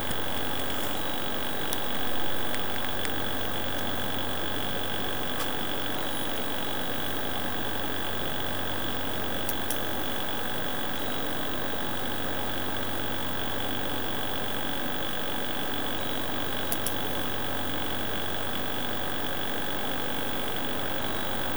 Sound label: thinkpad t43 fan noise sample